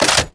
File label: Reload Chg Hndl Back; weapons; odin